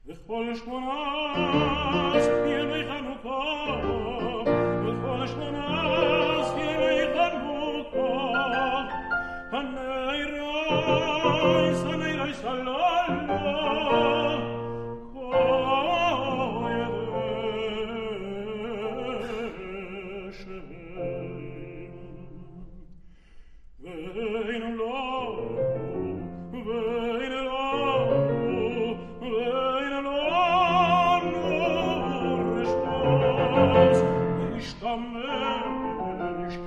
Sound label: choir; Haneirous Halolu